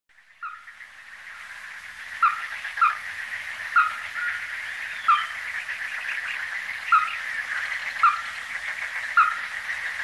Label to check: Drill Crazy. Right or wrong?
Wrong